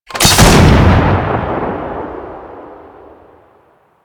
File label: Missile Platform Launch; Grn Missile Platform